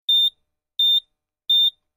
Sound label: Alarm Synthetic; hi-tech various